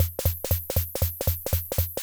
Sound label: Loop, classic machines, rhythm77